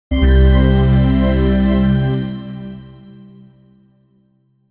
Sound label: a short intro chime used; digital-twin